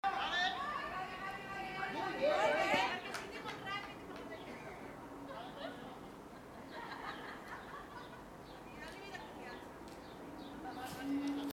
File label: People in the park